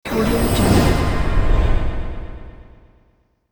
magic maker

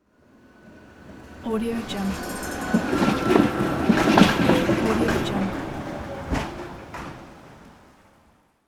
tram passing by; moonaudioproduction

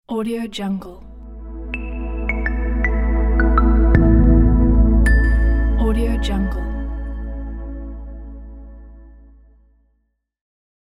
bells logo

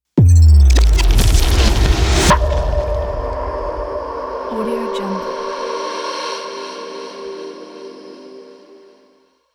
corporate logo intro

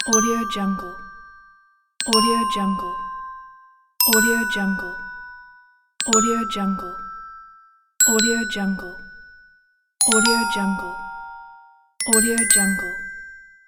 interface sounds

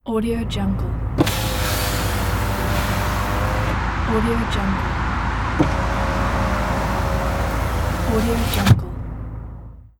car window regulator

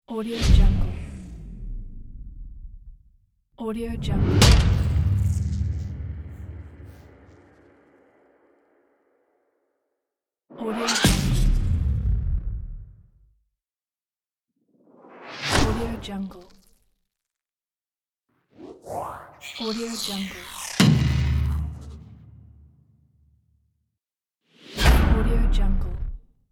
cinematic hit whooshes sfx pack

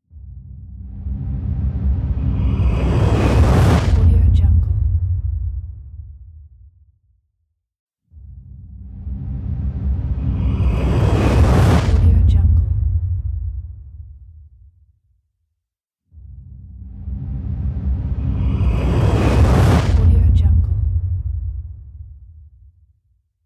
transition whoosh with hit and flyby

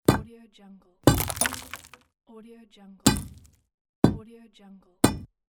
hammer hits stone

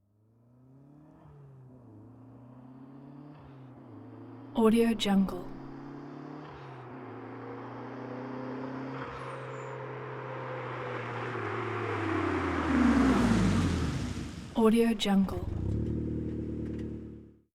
turbo racing car approach with stop idle off; hollywoodedge

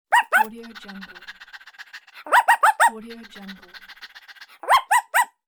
cartoon dog barking panting

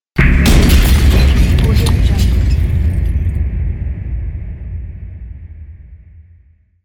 shot sfx

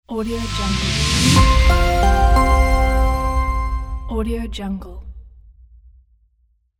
elegant logo; fox-production

